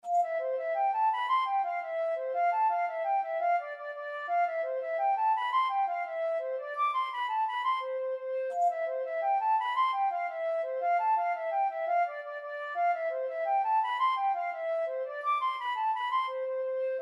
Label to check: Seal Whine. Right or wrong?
Wrong